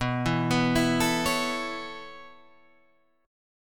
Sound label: B 9th Suspended 4th B down Guitar Half Step Down; B9sus4